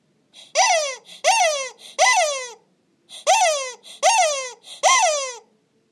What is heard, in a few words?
loofa dog the original squeak